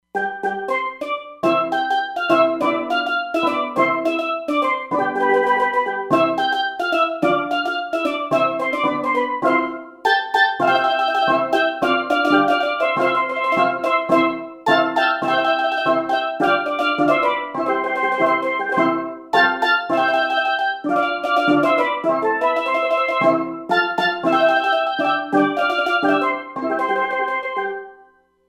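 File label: Steel Drums, Roland Fantom XR; 50`SteelDrms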